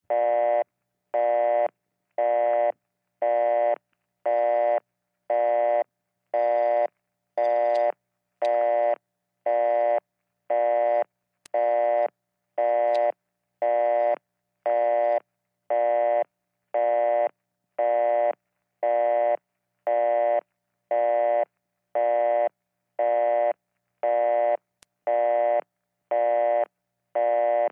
Tel Busy Signal Buzzi; Futz Busy Signal

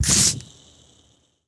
Bottled Drink Twist

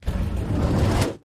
Slide On Floor, trunks

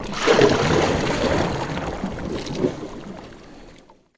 Canoe Paddle Stroke Underwater

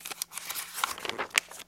Book Page Turns

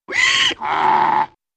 Donkey Bray heehaw

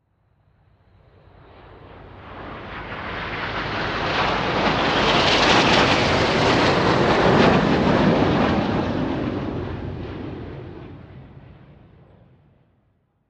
Plane MiG-29 Fulcrum Far

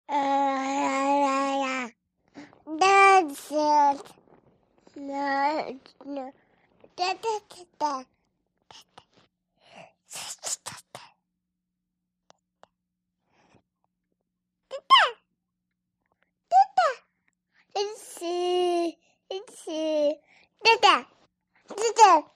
Baby Girl Fake Cry, thirteen and a half month old

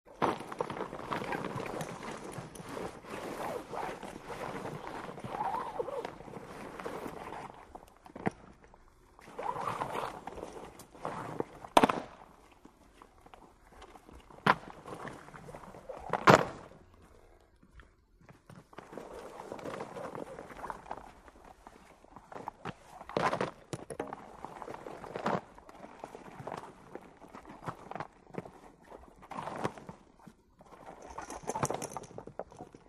Fire Hose Pulled Over, fire truck

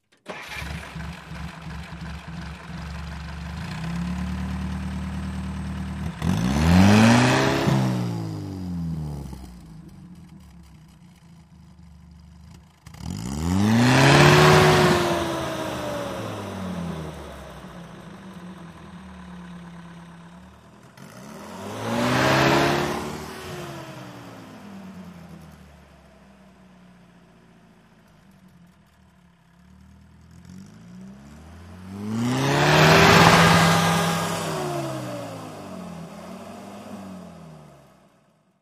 Army Jeep Start Big Re

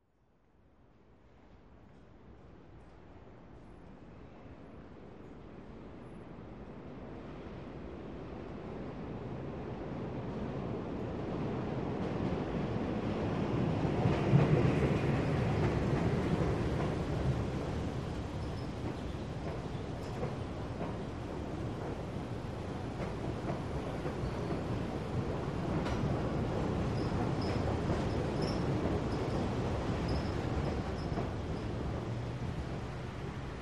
Subway Trains By Away

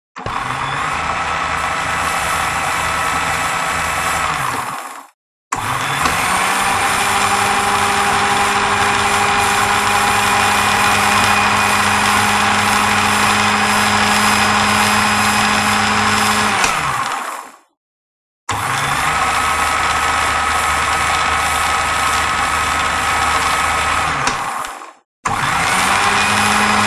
Food Mixer; Food Mixer On, Run And Off.

